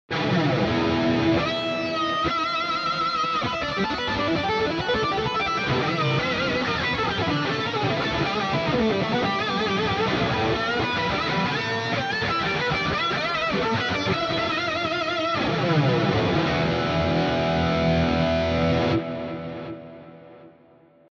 Heavy Lead Stereo Out; MGK Signature

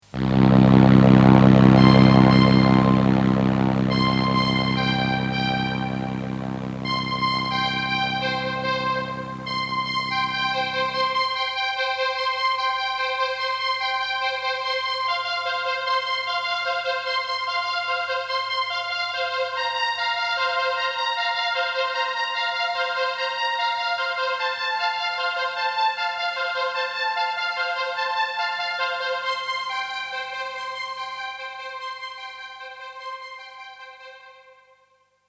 Behringer Solina RE 201

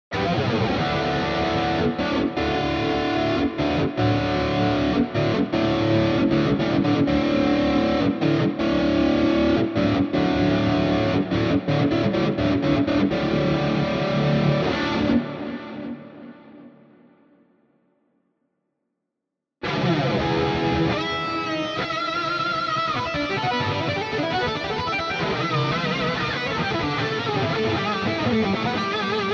Heavy Lead; MGK Signature

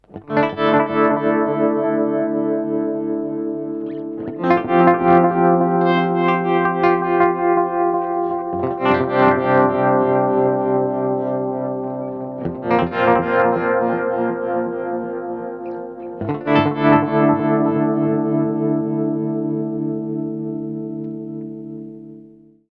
Tremolo Delay

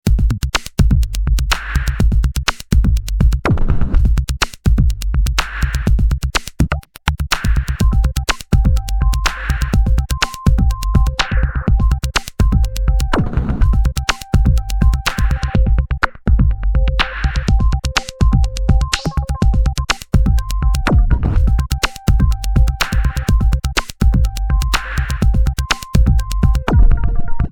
Break Pattern; Venom

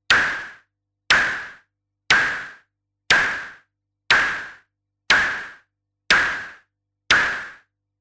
Submarine Snare; Kobol

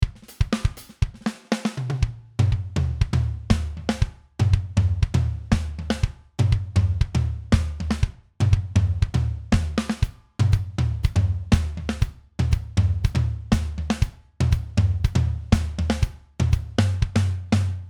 Steven Slate Drums 5.5 Dry Tight; Deluxe 2

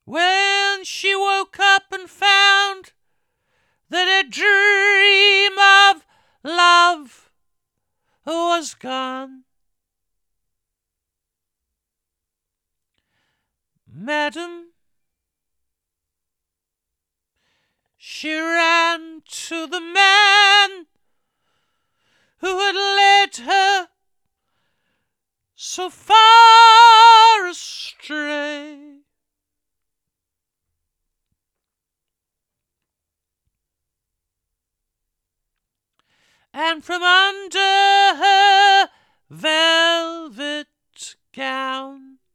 Vocals DRY

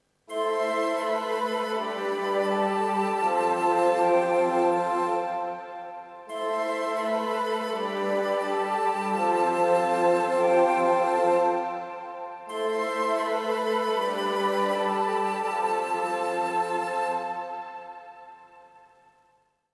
Augmented Woods Steinhof Kirche Vienna; VSL MIRPro3D; Arturia

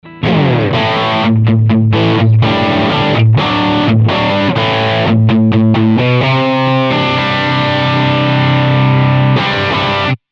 Amp MR Stack Power Amp Dist; Simlpifier

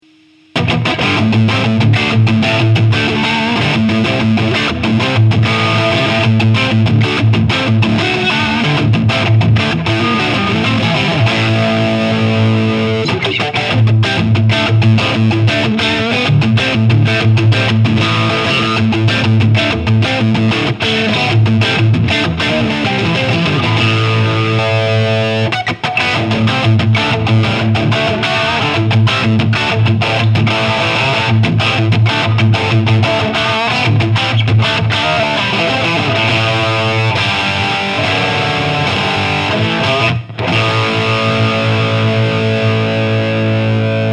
Gibson Flying V Lead

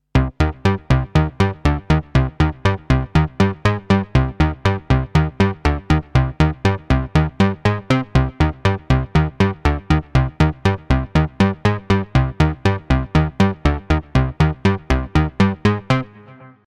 Arturia V Collection X Mini V Bass

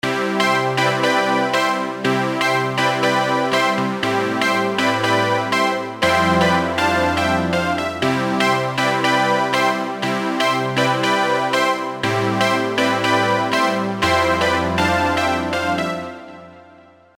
EZkeys 2 Synthwave Cassette; EKX Synthwave 3

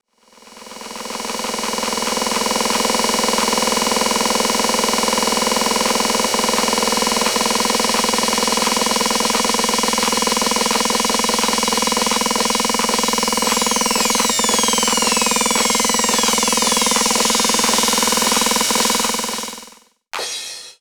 S 909 LFO Drone; SoundForce

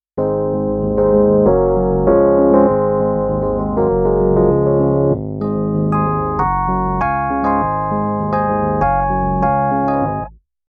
Nord EP3 Shallow Close

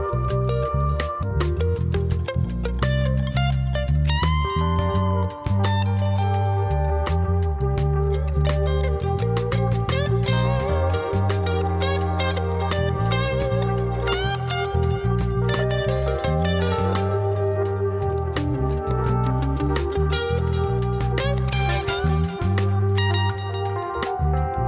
Mastering Plug In Warm High Comp Sat; LANDR

